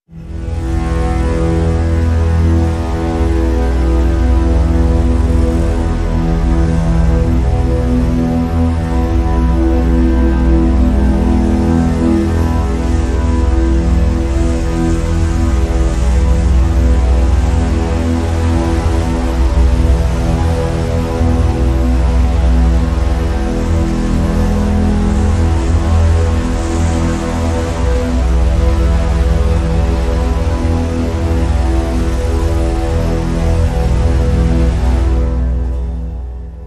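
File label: Drone Bowed Bass E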